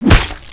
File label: hit